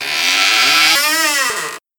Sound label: door creak